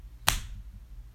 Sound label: slap; Whorblox; ROBLOX GAME ASSETS; SEXUAL sounds